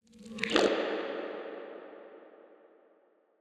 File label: water swell; soundscrate